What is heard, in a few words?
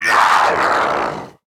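die, zombie male; nmr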